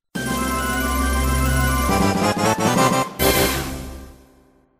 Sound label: oh well music; cave